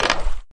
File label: log jump; CrossyRoad